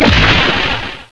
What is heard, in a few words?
Z PHASE; HIGHTECH